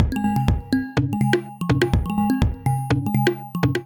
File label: Playful Kit 124 bpm; MIDI Clips; Ableton; Punch and Tilt